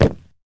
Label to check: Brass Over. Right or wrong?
Wrong